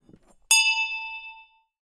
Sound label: bell school handheld pick up from table